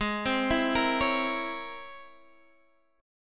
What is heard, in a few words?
C sharp Minor Major 13th G sharp, guitar chord